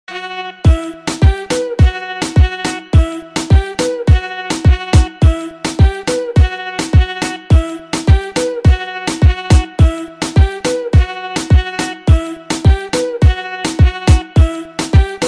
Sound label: lovely bgm ringtone; Gentleman